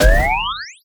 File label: Jump; Minora-Game; Sound-Artlist